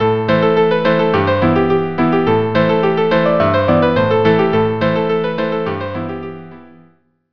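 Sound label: violin cello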